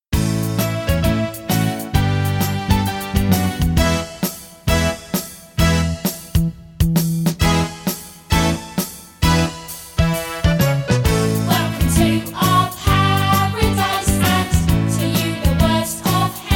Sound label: with vocals